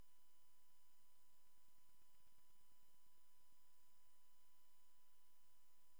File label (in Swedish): Barbastell, 33kHz; Stjarnfors; wurb